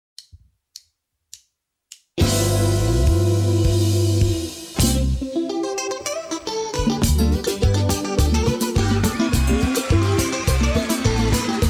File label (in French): Ambiance paillote, compas; Kompa